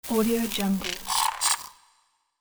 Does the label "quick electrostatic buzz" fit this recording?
Yes